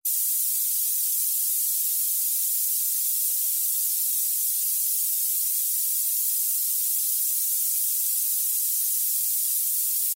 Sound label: noise hp